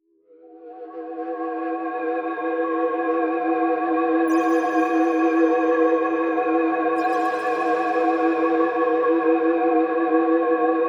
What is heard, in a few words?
Some more slightly scary ambience.